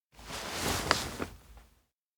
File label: stand from cockpit; anemoi